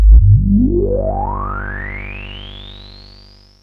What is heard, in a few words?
JUNO 60 G2